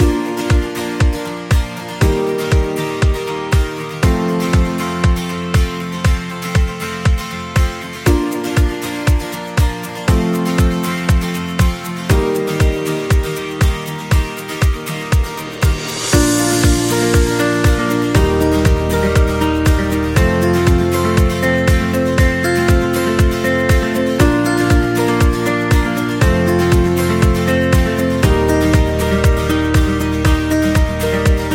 Motivational Acoustic Guitar; happy music vol 8

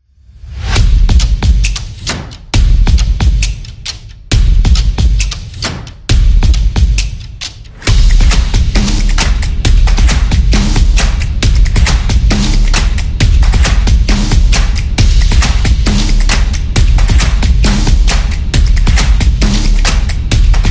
Drum Beat

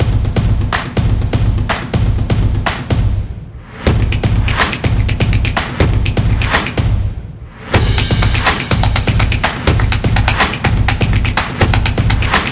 The Stomps Dynamic Commercial; high energy

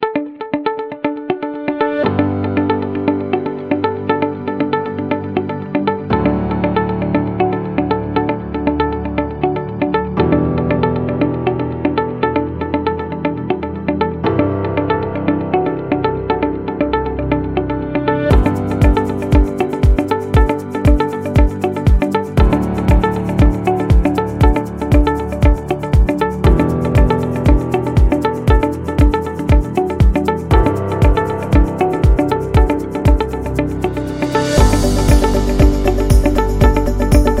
Simple Background; corporate music vol 12

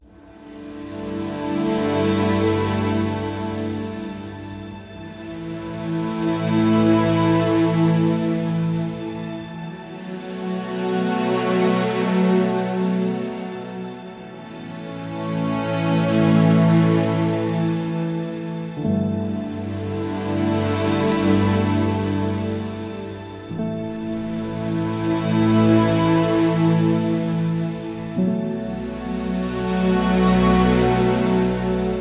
Soft Cinematic; waiting music

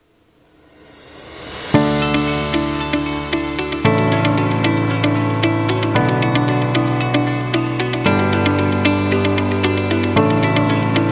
Positive Business, corporate; corporate success

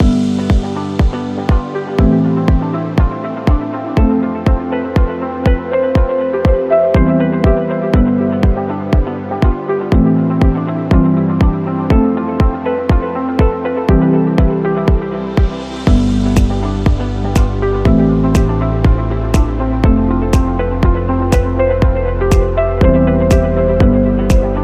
Medical Loop

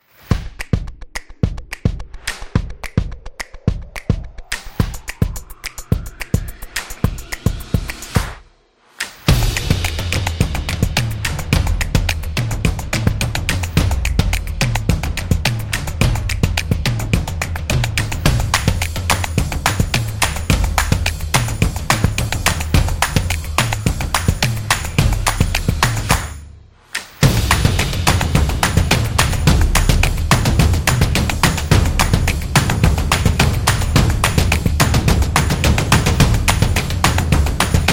Percussion; energetic percussion

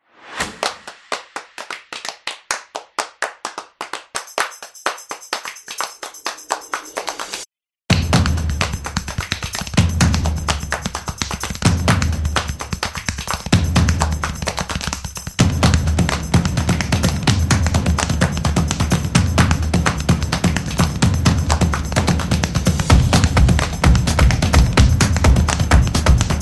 Drum; casual game

